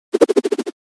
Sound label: Shuriken flying no hit